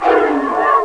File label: MOAN; thexder